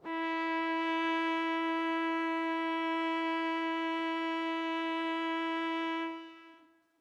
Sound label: violin trombone; interpolator